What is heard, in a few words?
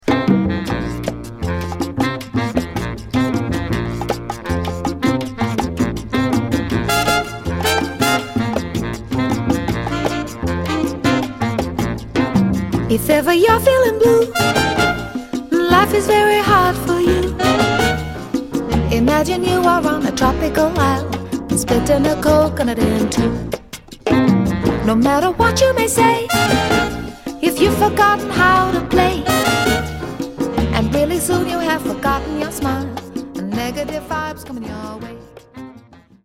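vocal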